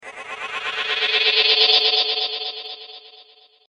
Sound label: Astral Space Whoosh; science fiction sound effect; star wars / trek style games; Andrew Fleming